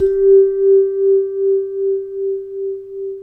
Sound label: VIBRAPHONE; ILIO